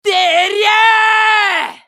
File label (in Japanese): ファイター男･攻撃長